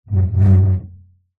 furniture chair dining metal framed slide wood floor med rub